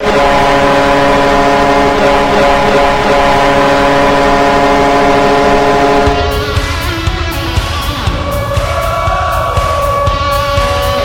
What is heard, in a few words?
Goal Horn; Canucks